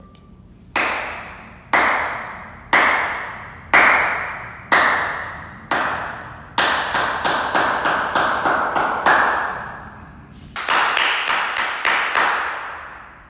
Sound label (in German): klatschen vorher